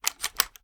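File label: foley reload loop load; weapons; gmod; viper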